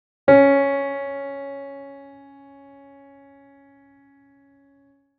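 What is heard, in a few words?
piano C#4 long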